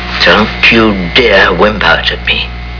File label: whimp